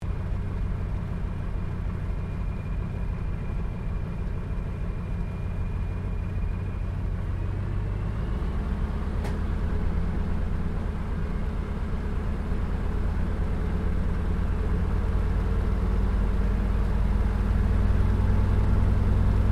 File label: AIRCRAFT CA-13 BOOMERANG PREFLIGHT INTERIOR STEREO; Airplanes